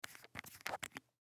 Lens Cleaner Lid Twist Off Plastic Bottle; Cleaning; Foley

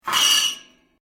Metal plates scrape; Materials; Foundation

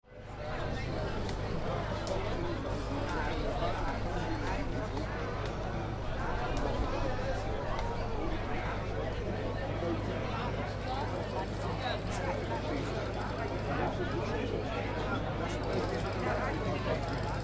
Outdoor Pub Ambience, Open air Pub Cafe; Harlingen, Netherlands; on city street - Crowd walla; Outdoor Sound Effects; Lynne Music

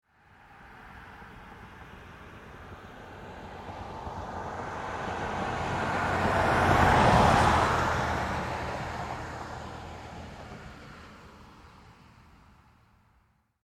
Auto Pass By Automobile Car; Vehicle; Cars; Vehicles

